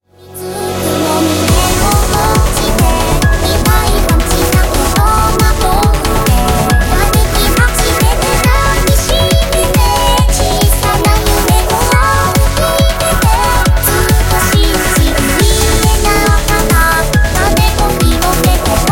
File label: BGM xfade; Invaxion